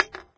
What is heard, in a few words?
metal pop can impact hard; physics; Source Engine; cstrike